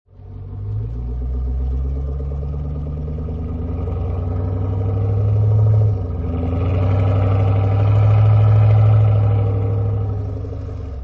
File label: pipes low speed, engine